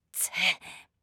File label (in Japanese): 攻撃